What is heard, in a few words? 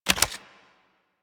Auto Crossbow Reload Clip Out; Warframe; Tenno